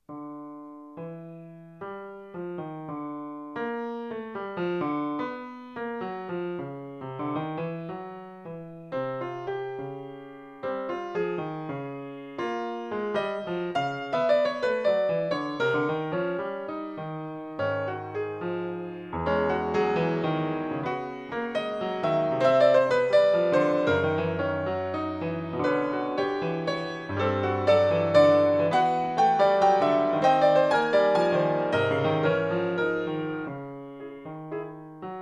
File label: valse, piano